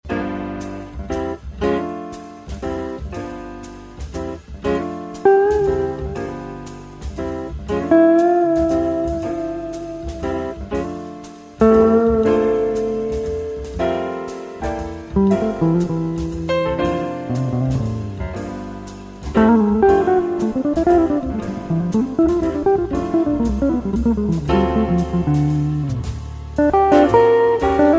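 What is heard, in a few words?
all blue solo, guitarist, jazz; work-in-progress solo experiment; diminished phrase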